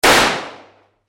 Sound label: warfare gunshot range, Handgun Gunshot; McKinney Sound